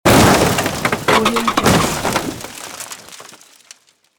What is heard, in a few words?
car drop